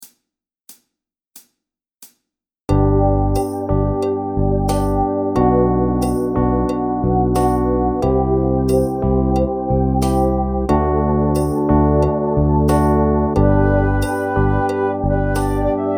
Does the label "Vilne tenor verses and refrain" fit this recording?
No